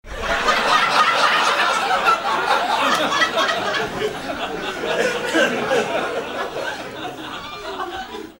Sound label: laugh long